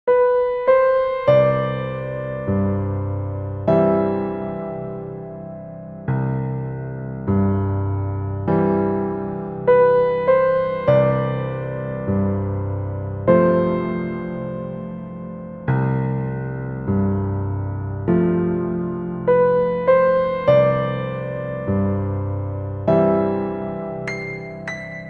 Hero Beachside Hamlet; Eternal Edge

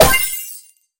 Caisse Break

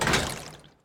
Mechbull Gears - Gear Throw

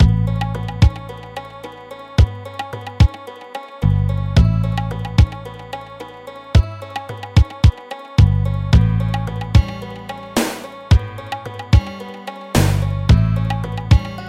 MUSIC Desert

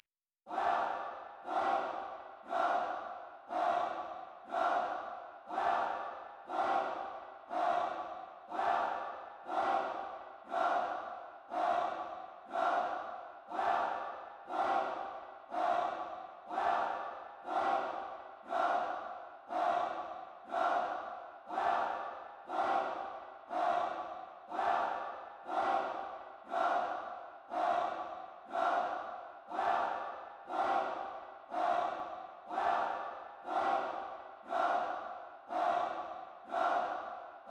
Rhythmical Cheer; Boss Koopa Env RhythmicalCheer; Super Mario Bros. Wonder

